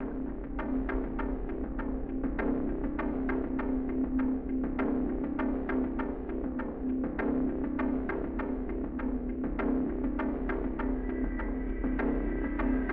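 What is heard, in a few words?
ambient long loop; transformers war for cybertron gamerip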